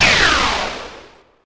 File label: crackle; CarmenTime 1997 Demo; TUNNEL